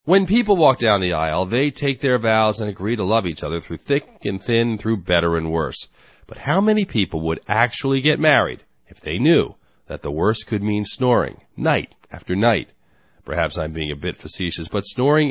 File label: snoring